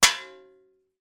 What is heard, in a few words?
impact metal dustpan